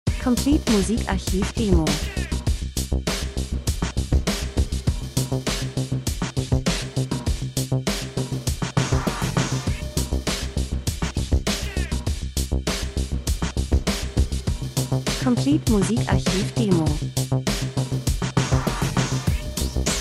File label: drums; Variation Elektro Pop Rhythmus Lifestyle Positive Stimmung Urban Club Abend Feiern R & B Industrie Dokumentation